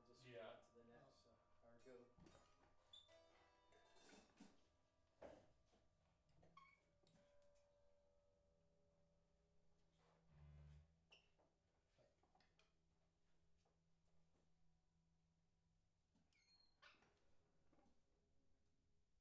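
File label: Rode OH stereo Drums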